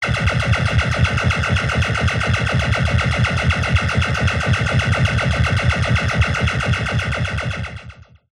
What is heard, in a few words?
Drones Synth Laser Drone; sound spark